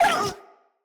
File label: Kubrow Feral Vocal Pain